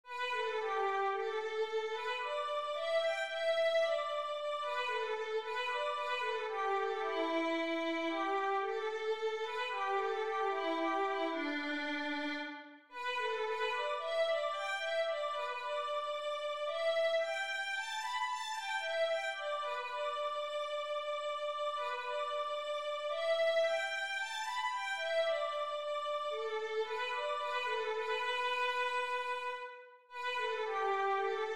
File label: jasmine violin